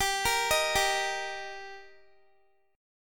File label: G Minor Sharp 5th G Guitar, chord; Gm#5 arpeggiated; x,22,20,20,19,x